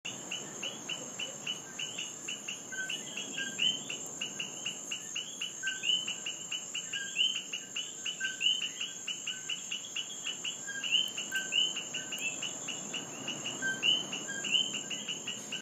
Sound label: coqui